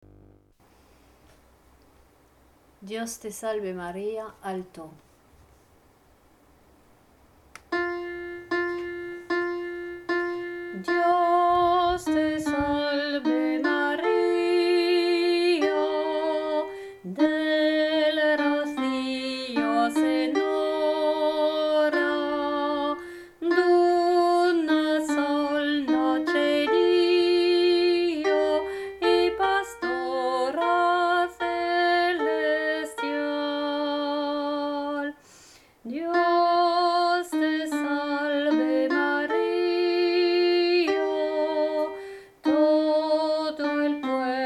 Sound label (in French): Training Alto Chanté; Dios te salve Maria